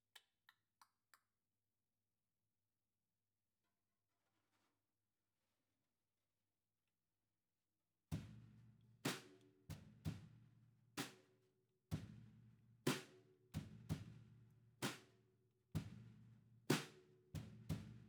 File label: Ride